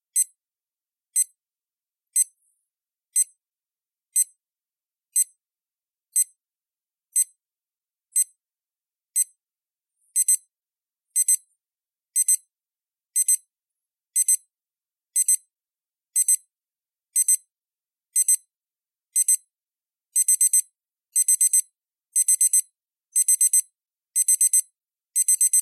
alarma

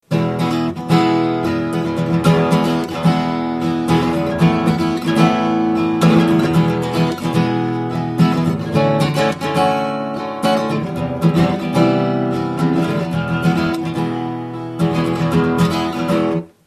acusticos

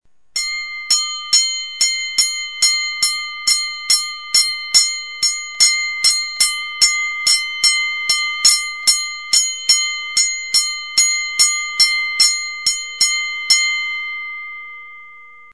Boxeo campana

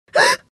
susto mujer

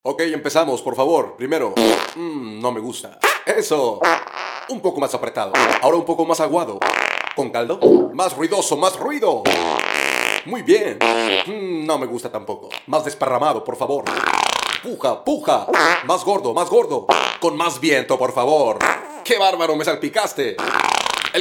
gas flatulencia flatos

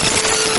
circuitos dobladas

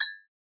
parpadeo parpadear

